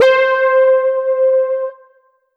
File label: Roland JV-2080 Chiffer Lead C5